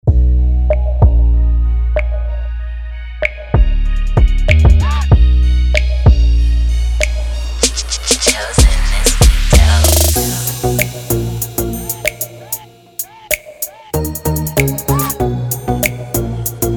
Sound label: Instrumental